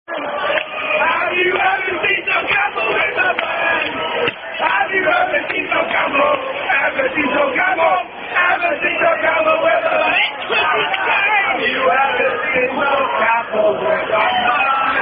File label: Football Chant; Sol Cambell Football Chant